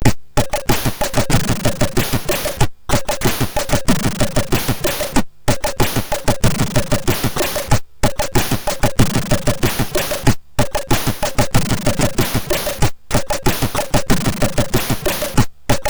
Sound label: Funk in House, MOS6581R4